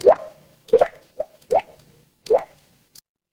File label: water drip